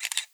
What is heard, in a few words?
camera